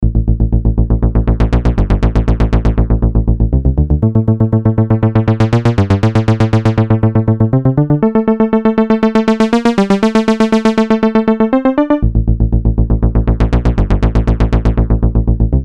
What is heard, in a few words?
synth